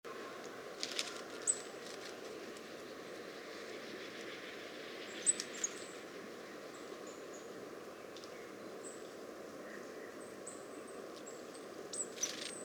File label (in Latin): Parus major; SETE